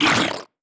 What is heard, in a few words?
bold angry; creatures; headcrab classic; hideout